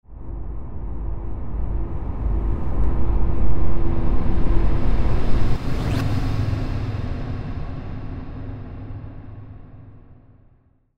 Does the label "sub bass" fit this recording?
Yes